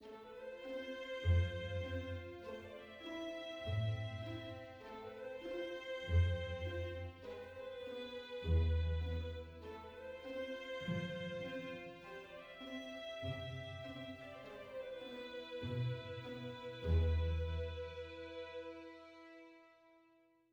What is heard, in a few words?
adventure soft